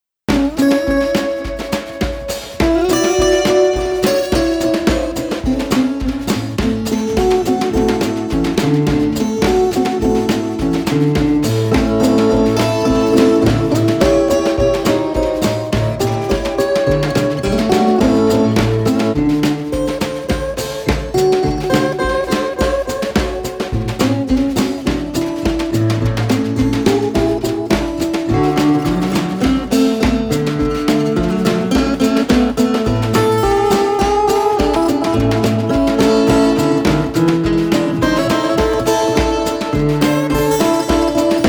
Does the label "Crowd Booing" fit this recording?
No